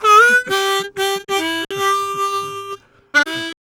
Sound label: Harm 129bpm G, Harmonica; Quazibeat - African Secret